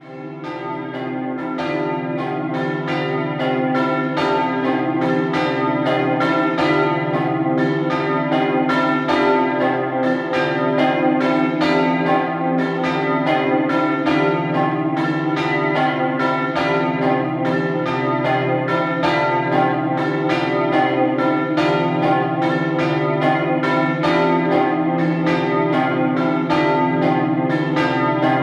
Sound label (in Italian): Montenars Sant Elena Doppio, campane